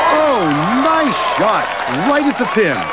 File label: open